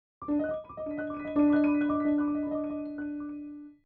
pitch cloud, Cloud of pitches drawn from the source spectrum; the piano chord used in the granulation is passed through a bank of resonant filters tuned to those partials that are beyond the piano's range; Spectralism and Microsound